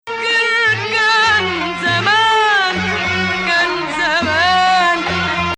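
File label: Ajam Hijaz, Arabic music; Ajam, which resembles the Western Major scale, has two distinct versions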